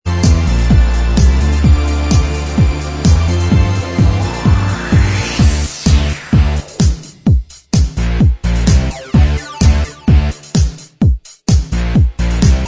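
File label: Instrumental Track, backing tracks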